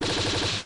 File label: Comet Punch; Pokemon Essentials; Pokemon Iberia V1.03; Move SUPERPOWER